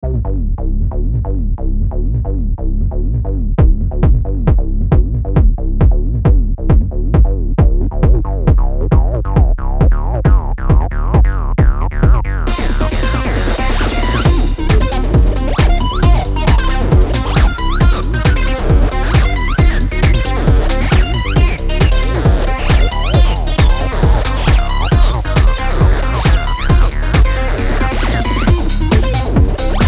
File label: with drums; audioblast